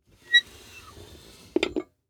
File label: Table Break Away Table Leg Movement Down, Household